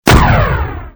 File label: gun